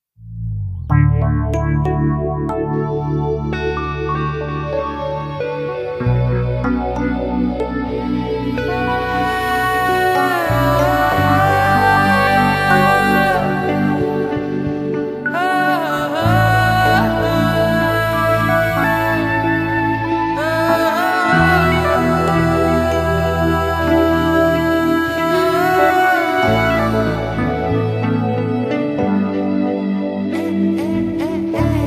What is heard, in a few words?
Instrumental